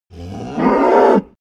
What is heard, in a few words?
animal cow moo single short; smartsoundfx